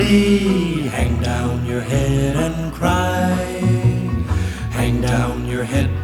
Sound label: music; wu xing; earth